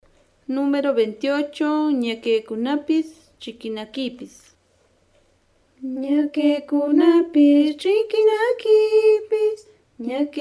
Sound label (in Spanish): cancion